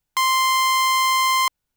Ramp C5, keys; fleet keys Samples

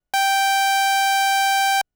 Ramp G4, keys; fleet keys Samples

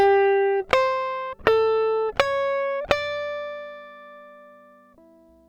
gtr trem

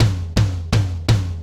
clack bnc

